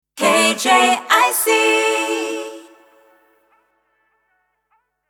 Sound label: Sung Jingle, 100bpm, A Major, ACAPELLA; KJIC 2020